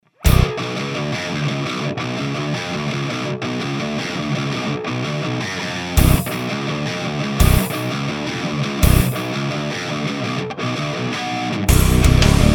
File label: Diezel SM57 no torpedo